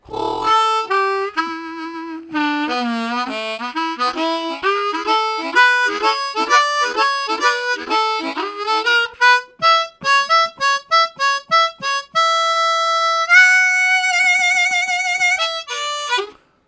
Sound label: Drrrr, Harmonica, Riffs; Zero G